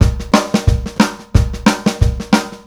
DRUM, COUNTRY; Best Service Advanced Media Trax Modern Composer

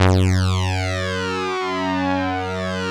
DS8 F#1; Korg; Back In Time Records; Korg Universe VOL-1

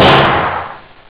sdrum; jambala; Amiga Soundtracker Sample Packs

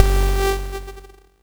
Fuzz Bass Squeeker, Cwejman S1

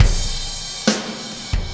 Impact Crater Beat, Processed Drumkits

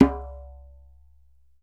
DJEMBE, African Perc

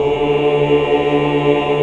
AAH

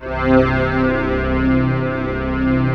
STR, YAMAHA, Analog; Analog Fantasies; USB Soundscan vol.49; AKAI

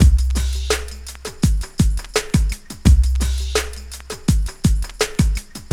SUB; AMG; Now CD-ROM (Roland)

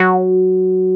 MC 202, BASSES; Masterbits - Vintage Voltage; VV BASSES

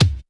BD, Bassdrums, Roland TD-20

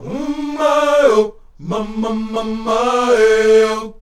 UM MAY, Afro Chants

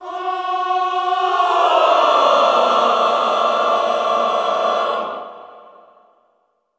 Classical Choir; Best Service; Extended Classical Choir

